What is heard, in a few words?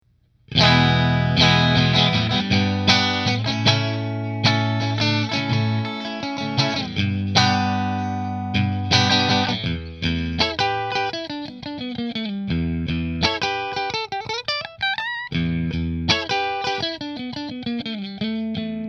Rahan Position 3 Fender, guitars; Amber Burst